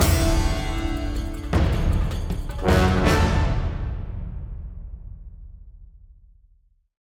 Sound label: Perc end tag